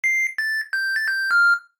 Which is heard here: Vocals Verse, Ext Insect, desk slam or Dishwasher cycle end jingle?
Dishwasher cycle end jingle